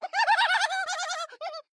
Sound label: laugh; junkrat